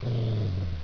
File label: DOG, animal; 动物